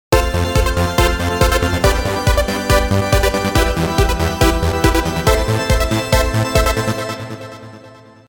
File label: OSCILLATOR fine